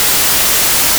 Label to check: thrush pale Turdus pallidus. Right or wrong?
Wrong